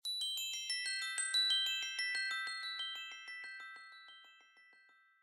Joy joy joy

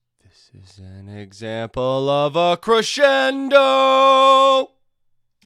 Crescendo example

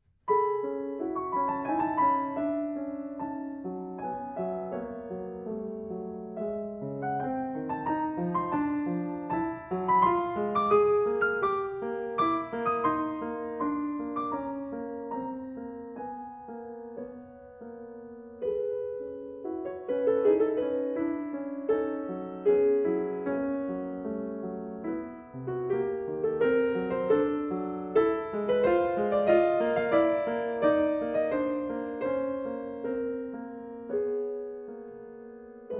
Seven Miniatures For Piano; Rondino

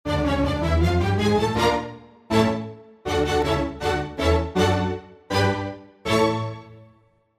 Surprised

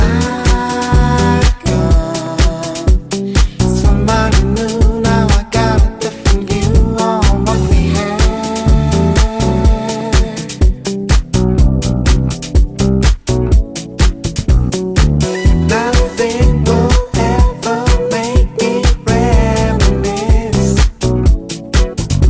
House Music Samples

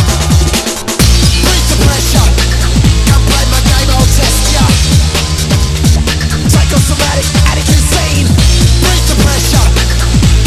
Electronica Samples

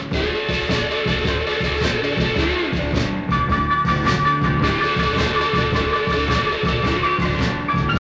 Riff

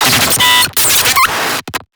distorted glitch; PMSFX; Glitchy Circuits

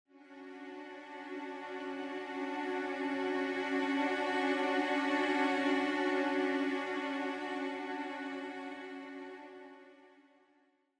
SOUND OF SAD

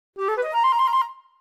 surprise

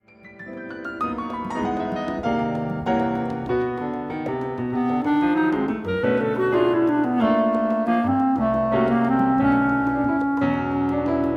Clarinet Sonata

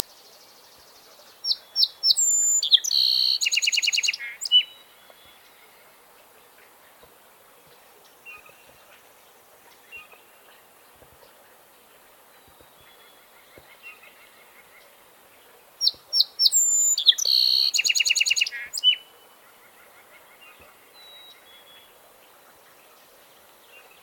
Melospiza melodia Song Sparrow

